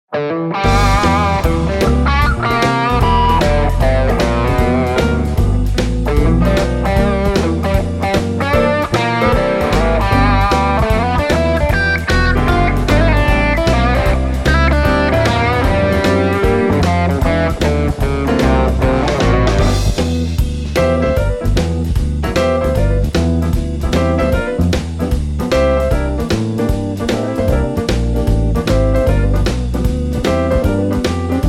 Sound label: Rock blues G